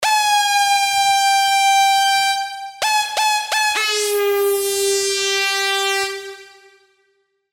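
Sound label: Synth Sample & Hold; SERUM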